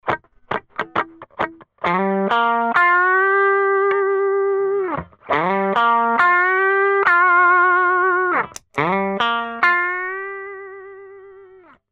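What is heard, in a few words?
Without / With (randomly) Sardine Can (Compressor + Rectifier + Color), guitar; Celmo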